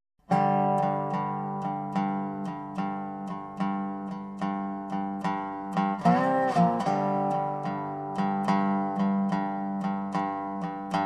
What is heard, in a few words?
Blues; Dobro